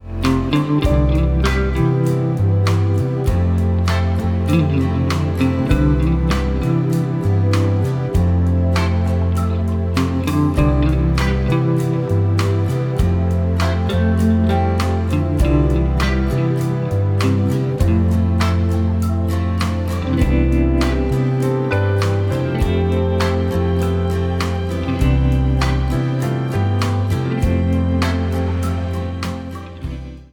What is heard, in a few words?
Gretsch 6120